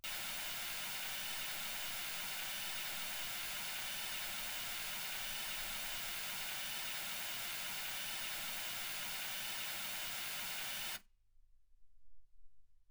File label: mls 48kHz